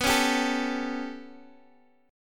B 7th Suspended 2nd Sharp 5th down Guitar Open D, chord